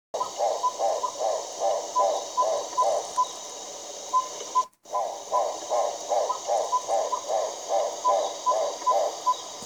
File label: Townsend's Solitaire, Myadestes townsendi; Aves; iSoundNat; inaturalist